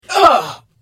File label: Hit; The Elder Scrolls III - Morrowind